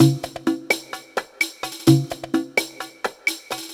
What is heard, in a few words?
Percussive Loops 128BPM; Vengeance Samples